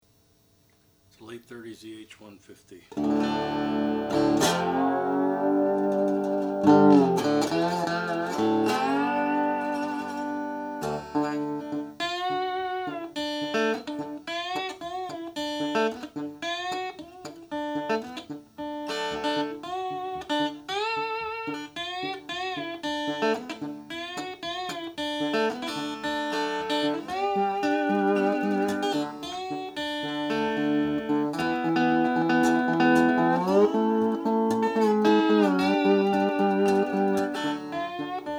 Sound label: gibson eh150 sunburst